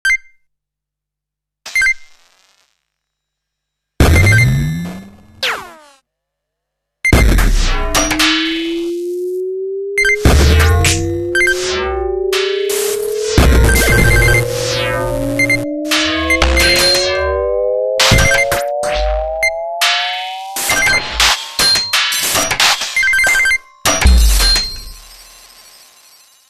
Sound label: arcade, alarm clock; Nokia; Nokia Design Team; Alarm Clock Retrospective